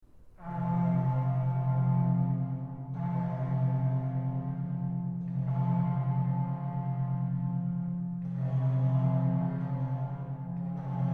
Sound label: instrumental lament